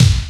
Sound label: PERC FX